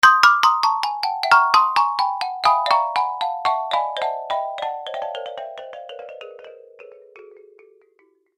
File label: Cartoon Fail With Delay; Cartoon Sound Design Sound Effects